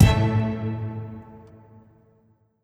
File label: 100bpm light staccato cello ENDING